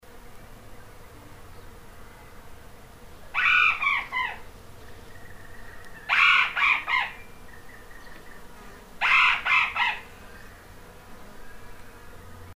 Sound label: Australian Owlet nightjar; Tidolick Wallis Rd Ewlymartup